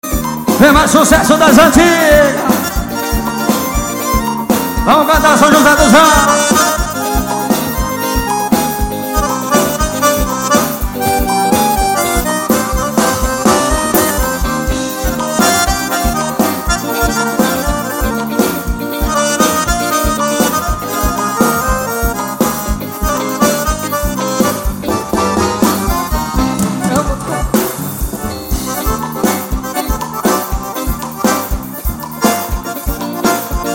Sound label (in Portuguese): forro bate esteira